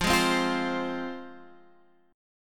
F Minor, down, Guitar, Half Step Down

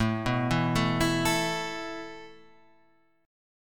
E Suspended 4th A

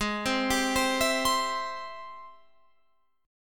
G sharp Augmented Major 7th, Guitar, Open F; arpeggiated; JGuitar

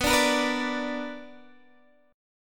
B Minor Major 7th, Guitar, DADGAD

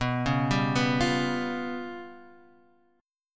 G 7th Flat 5th

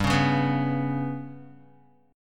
G Major Flat 5th Guitar, chord; GMb5 strummed; JGuitar

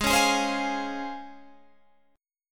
Db Major 7th Ab

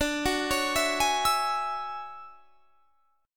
D Major Sharp 11th D, chord; DM#11 arpeggiated

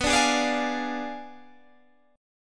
B Diminished 7th B down Guitar Open G

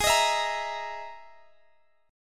E-Major Sharp 11th G sharp

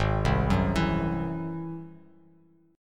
Ab Augmented 7th Ab down Bass Standard; Ab+7 arpeggiated; JGuitar

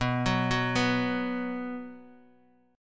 B 5th B down Bass Standard; arpeggiated; JGuitar

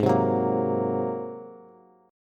Ab 9th Ab down Bass; Ab9 strummed; JGuitar

